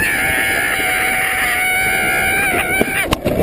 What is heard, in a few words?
exited man in alpine coaster stun a girl by crashing into her